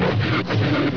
PHASER

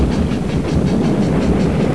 STRAIN

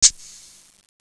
maraca; FISHWISH

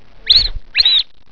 SQUEEKER

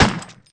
GUN

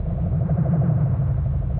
LASER

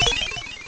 SPRINKLE; JEWELS

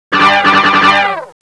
ERROR; 999wavs

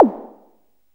WOOD ZAP; BEAT2000

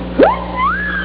woowoo; dot2dot

